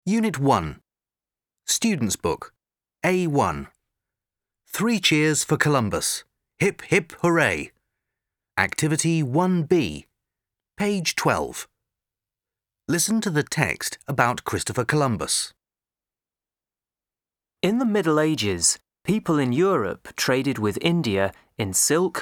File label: three cheers Hip Hip Hurray; Columbus